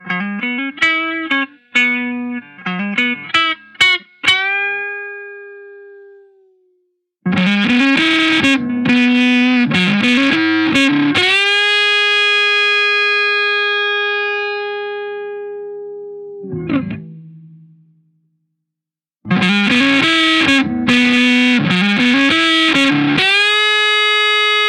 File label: neck lead SOLDANO; Lead Neck Sounds [No Pedal, Benderness 0%, 50%, 100%]